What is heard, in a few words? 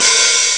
Cymbal, Amiga Soundtracker; Amiga Soundtracker Sample Packs